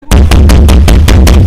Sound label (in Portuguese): batida na mesa